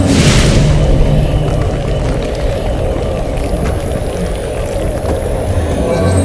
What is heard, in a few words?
Black Hole; Bolt